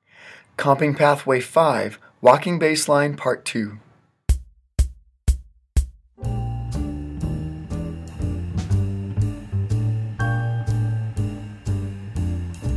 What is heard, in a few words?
comping walking bass line